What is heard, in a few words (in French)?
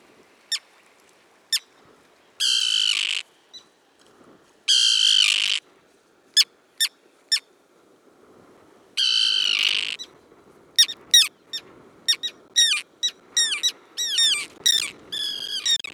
Sterne pierregarin Sterna hirundo; carnet nature ete